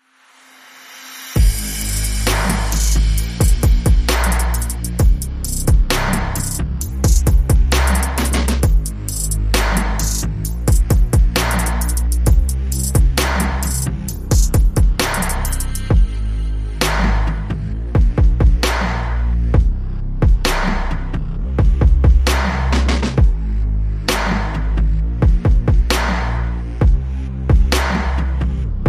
Drums Bass and SFX Only

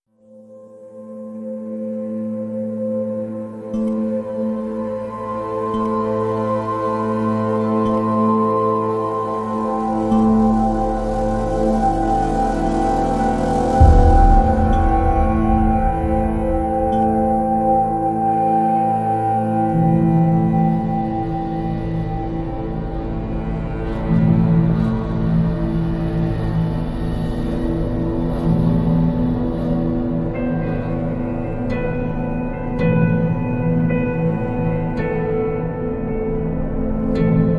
Underwater Devastation

